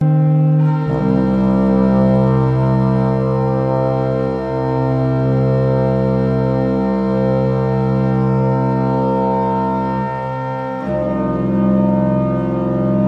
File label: for organ and brass